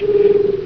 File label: TRIBBLE